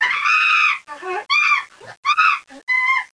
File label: chimp; The Pea; SoftKey